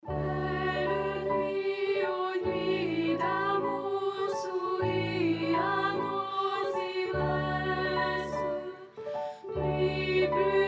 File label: Barcarolle